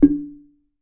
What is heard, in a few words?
African Wooden Drum Mid; ETHNIC and WORLD PERCUSSION